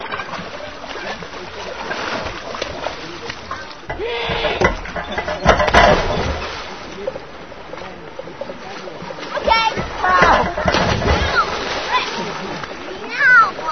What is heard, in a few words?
Pool Soundscape